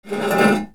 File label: BATHROOM